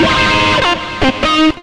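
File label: extra lead (nasty bend); Sonic Foundry (Sony Creative Software); Ugly Remnants VOL-2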